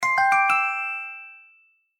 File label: Music Box, ringtones